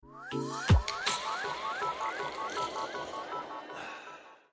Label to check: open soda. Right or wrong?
Right